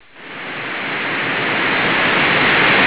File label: falls